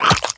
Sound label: headcrab head bite